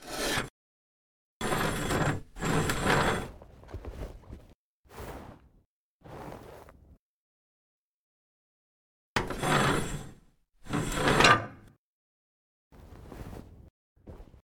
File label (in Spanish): saliendo alcantarilla; PAULINE